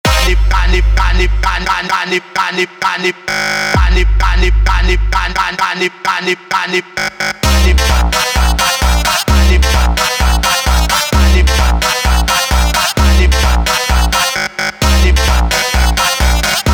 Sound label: Phonk